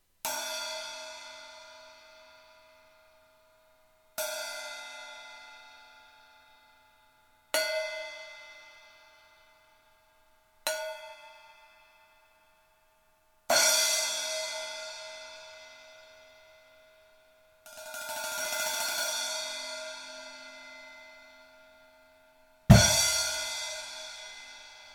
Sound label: Rock Splash 12; Amedia Vigor